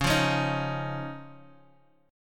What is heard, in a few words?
C sharp Major Add 9th, Guitar, Half Step Down; C#add9